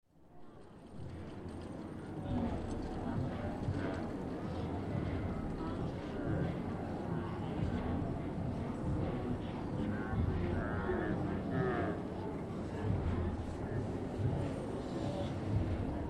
Drumming as band passes in old town square percussion and crowd 2; Drum Kit Sound Effects